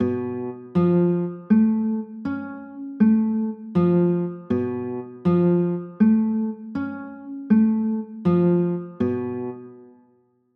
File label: c sharp 7 sharp 5, chords